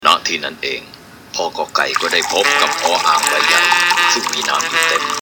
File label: cartoon